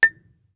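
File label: Marimba deadstroke fortissimo A6; MIS Pitches - 2014; Percussion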